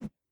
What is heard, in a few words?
Weapon Swing